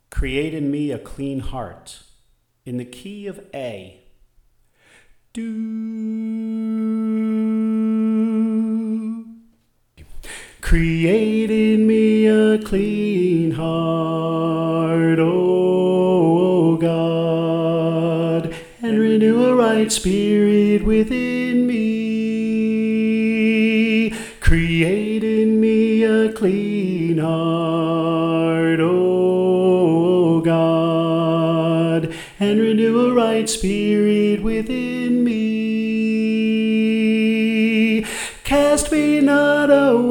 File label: Soprano